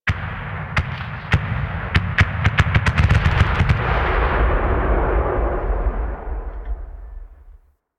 Mortar Launch; Missions; Venus; Orb Heist; Bounty Three

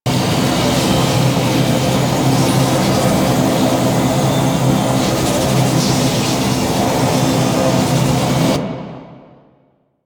portal shatter open loop; Ambience; Props; Warframe; Duviri

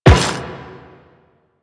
Run Metal, Footsteps; Warframe; Quests; The New War; Kahl

